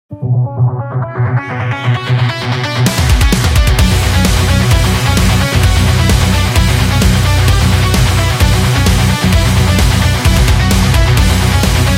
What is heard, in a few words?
Gym Energetic